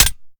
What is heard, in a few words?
reload empty magin; gmod